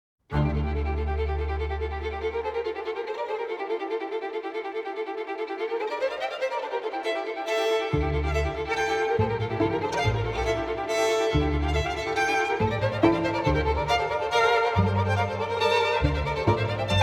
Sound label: violin concerto excerpt